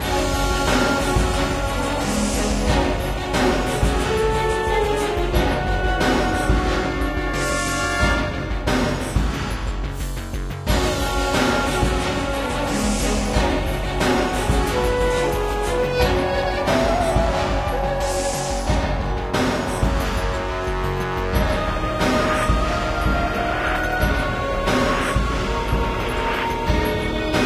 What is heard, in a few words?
Mining Complex; James Cameron's Avatar The Game